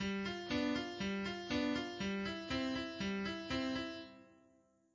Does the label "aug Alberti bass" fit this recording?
Yes